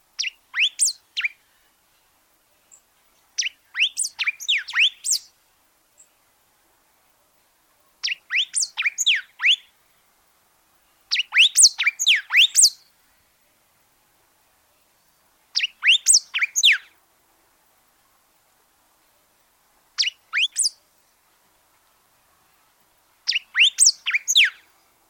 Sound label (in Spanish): CARDENAL AMARILLO